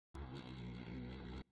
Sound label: Pseudo Dragonfly